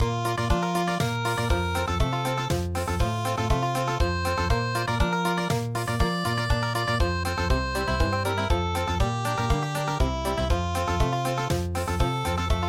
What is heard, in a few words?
country; Schweizerpsalm